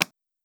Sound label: move